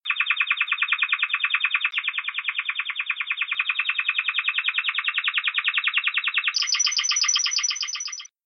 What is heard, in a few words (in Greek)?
καστανουέλα, timbrado español; θετικές νότες